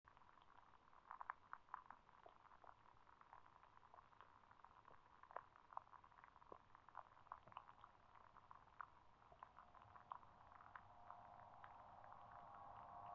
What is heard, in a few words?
aiplane noise